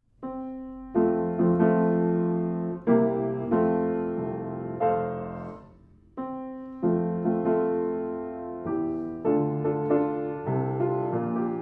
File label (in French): beau sapin piano seul